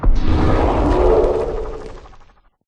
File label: prepare attack, evocation illager; Minecraft